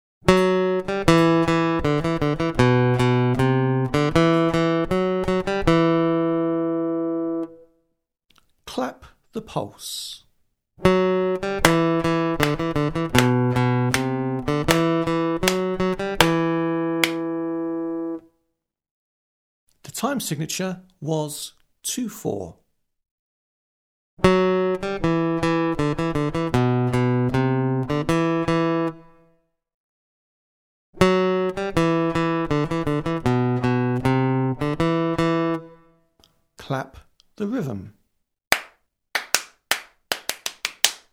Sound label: Aural Rhythm Test, acoustic guitar; Grade 6